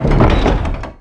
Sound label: castle door open; birthday party bash; wii; Spanish; princess room sfx